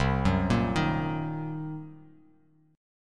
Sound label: C Minor 7th Flat 5th, C, down, chord; arpeggiated; JGuitar